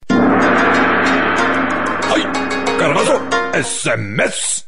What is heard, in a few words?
japanese sms